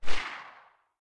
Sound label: silencer p220 mono very far, weapons